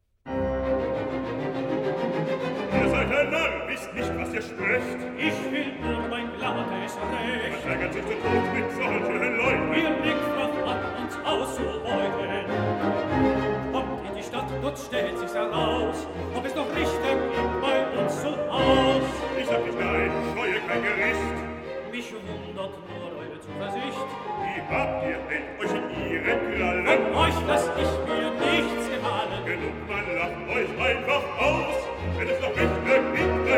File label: Duet; Struthion